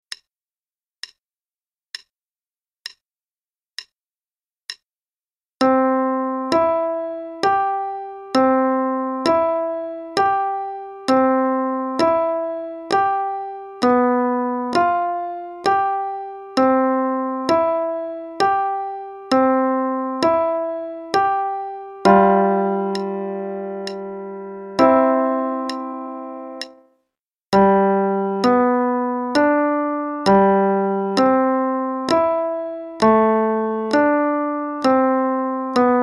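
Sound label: Play-a-long, Waltz, left hand only, quarter note=66; Kohler; Waltz of the Young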